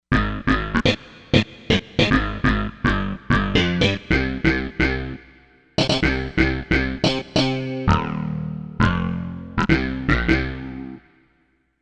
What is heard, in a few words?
Rude Bass, Korg MSC-07S Synth